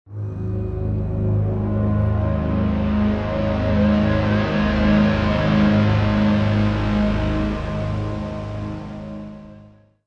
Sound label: Modern Foreboding; Civilization Revolution; Xbox